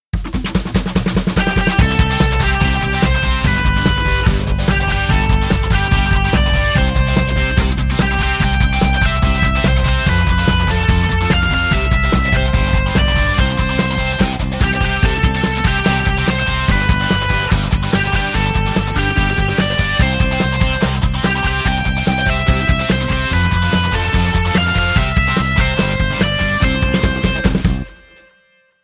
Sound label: Birthday Party Rock